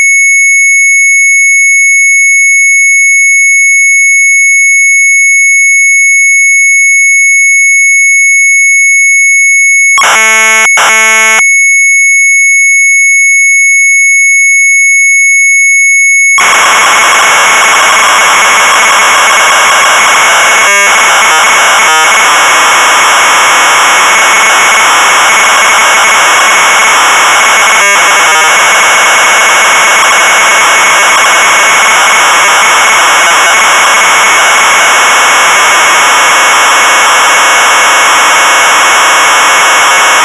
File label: MZ800 Tape; RADIOFREKWENTIES; sharp